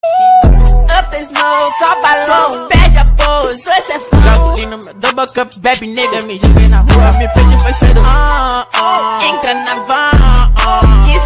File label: music